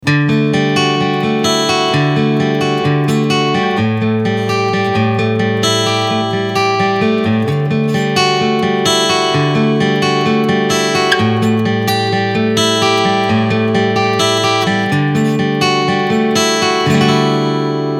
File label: Picking